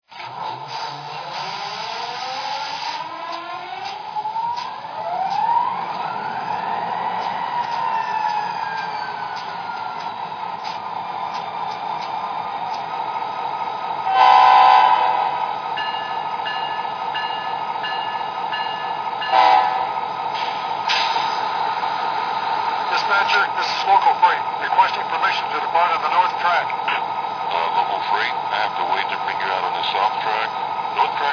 SD60, trains

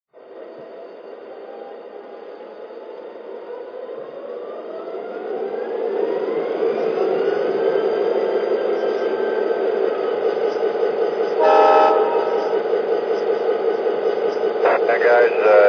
gp38-2, trains; ctc

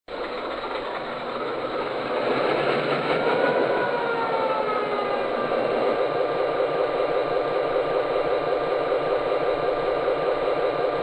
E6 ABA

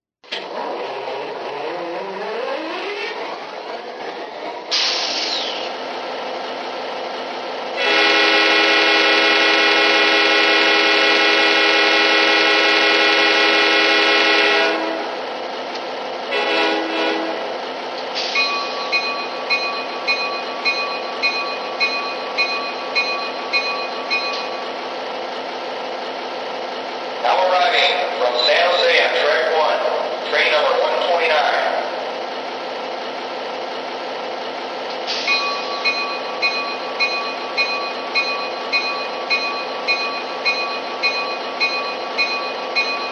F59PHI

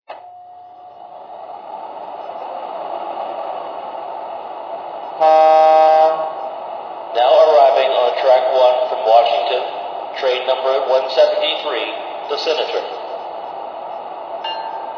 gg1; pc

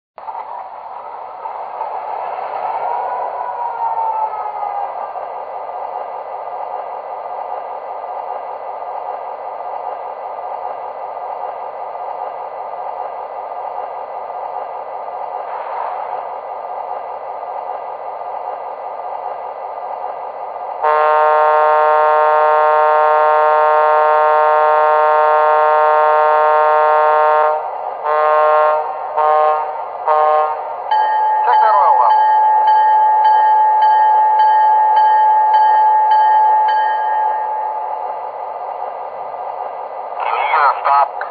F7, trains

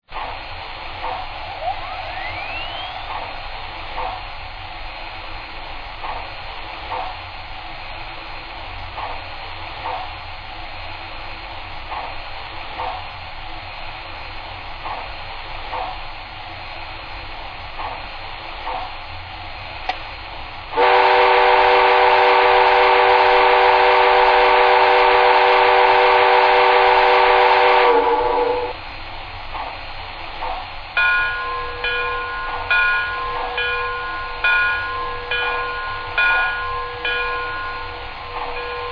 Mikado

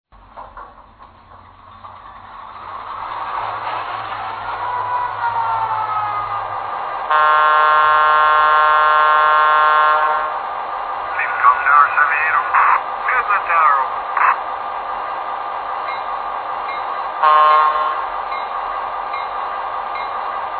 F7A, trains; WM

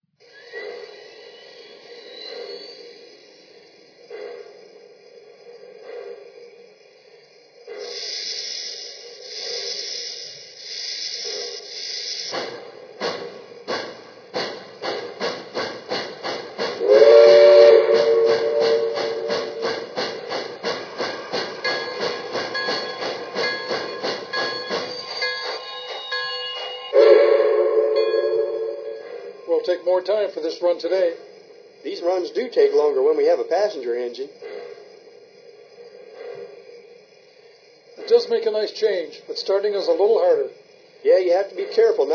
berk, trains; pm

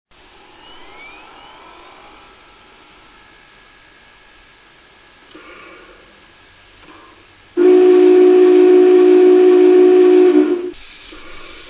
BIG BOY 4014

